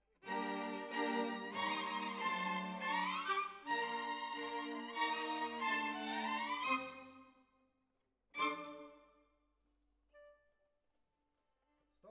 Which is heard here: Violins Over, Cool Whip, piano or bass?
Violins Over